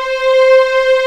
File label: C5 LEG MFI L, String; East West; The Ultimate String Collection